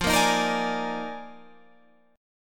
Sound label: Eb Major 7th Suspended 2nd Suspended 4th F